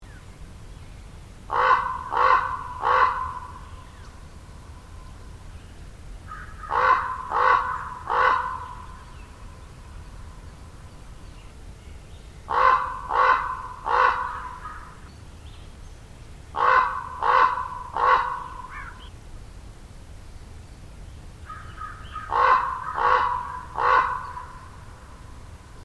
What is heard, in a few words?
common raven